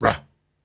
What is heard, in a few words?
Grunts and Chants, Viking Vocal